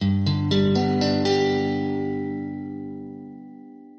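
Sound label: Gmaj, Guitar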